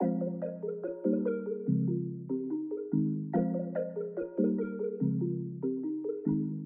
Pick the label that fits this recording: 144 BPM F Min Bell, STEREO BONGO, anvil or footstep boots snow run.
144 BPM F Min Bell